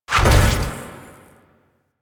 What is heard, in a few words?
Execute Teleport; Warframe; Warframes; Pax Duviricus